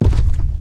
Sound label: Boss Footstep